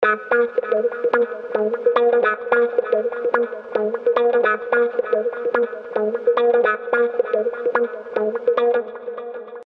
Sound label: GTR Delay Reverb send